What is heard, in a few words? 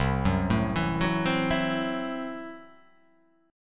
C 6th Flat 5th C down